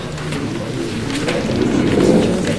Office; hl2

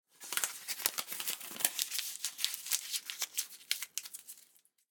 Crumbling Printer Paper; Kevin Durr

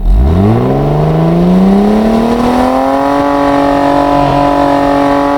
atv first gear to full throttle loop; hl2

